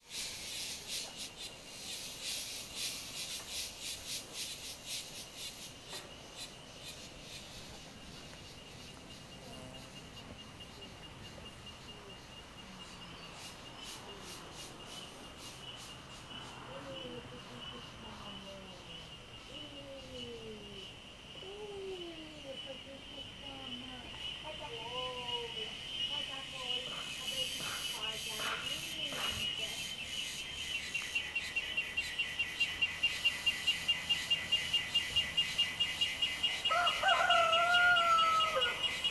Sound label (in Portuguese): Ambiente de cigarras muito forte em comunidade galo vozerio manha; insetos